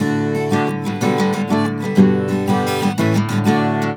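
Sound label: guitar, 120, Celtic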